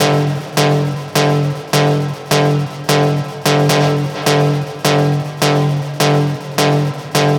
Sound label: Synth Loop 130 G, Techno; Loops; Synths; GhostHack; Ghosthack - Free Techno Sounds 2024